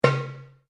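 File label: Drum Darabuka Edge Slap forte, Egyptian Darabuka Drum; Commanly available Egyptian Darabuka Drum; Musical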